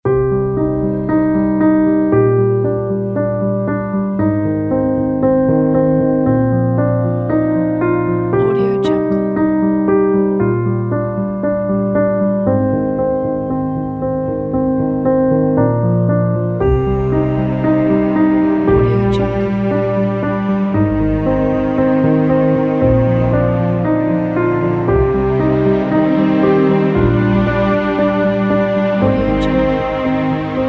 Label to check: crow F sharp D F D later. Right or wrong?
Wrong